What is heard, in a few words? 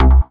Bass C, Offbeat Bass